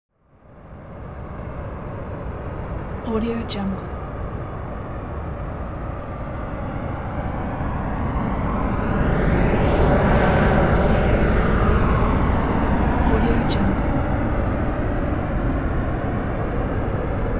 transportation jet plane airbus a320 flyby distant front